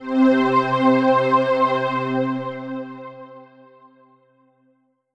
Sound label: Yamaha MU90R Grand Pad C3